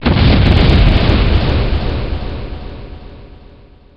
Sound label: EXPLO